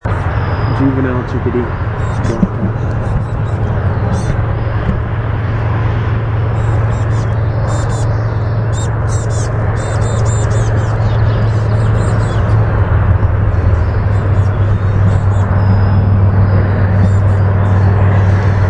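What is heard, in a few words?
Black-capped Chickadee begging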